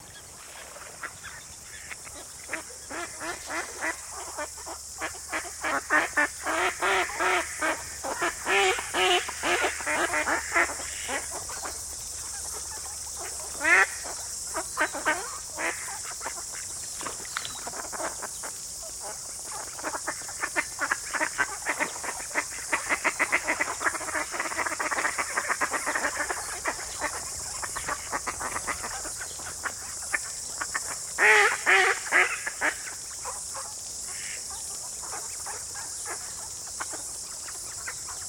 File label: Ducks Discuss The Recordist